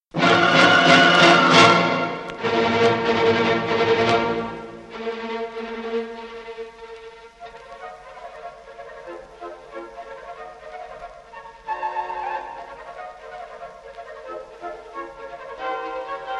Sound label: Saltarello (Presto); Fourth Movement; GUIDO CANTELLI